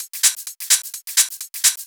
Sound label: Hihat Loop 128 BPM